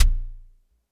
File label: Drum X Kick, Pearl Drum-X